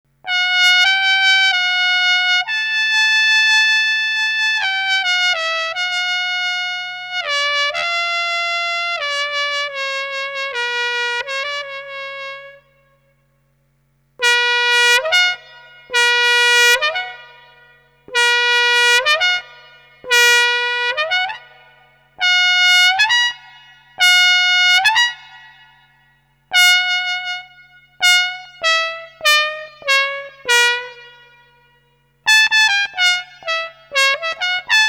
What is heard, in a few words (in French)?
trompette; Modeles Physiques